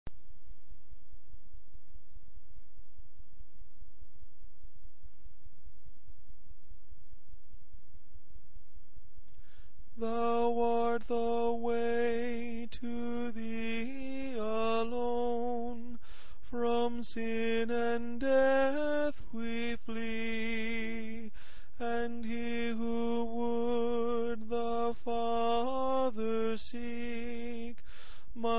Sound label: Tenor